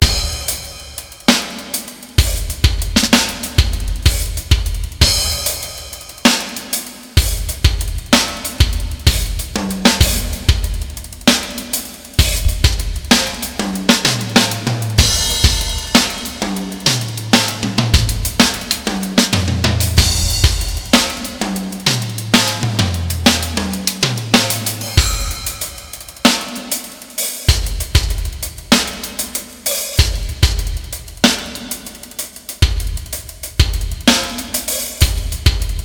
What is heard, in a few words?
verb test